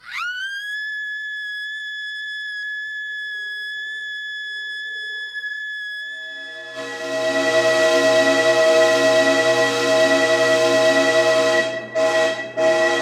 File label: Scream Into Whistle; sound design